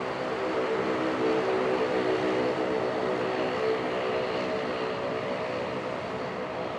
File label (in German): flugzeug; spuren